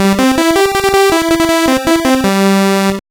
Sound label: Ditty; NBA In the Zone 2000; GBC